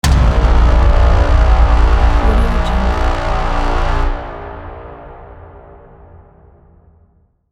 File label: epic horn; soundwood